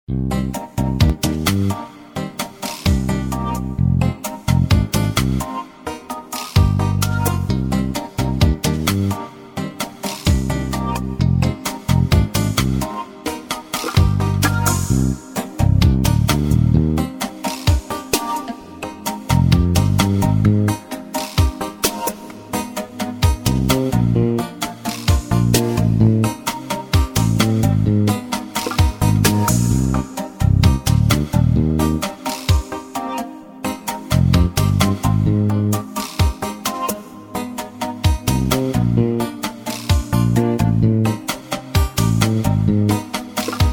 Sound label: brass minus